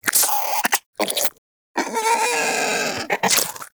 Vocal Tranquilized; Characters; Critters; Warframe; Cryptilex; Hunting Critters

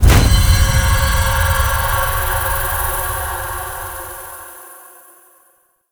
Minigame Finish; Birth Minigame; Warframe; Jade Shadows

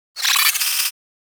space drone death; Enemies; Warframe; Corpus Space Drone

